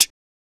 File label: Mouth Interface; ArmyRaid; Interface and Item Sounds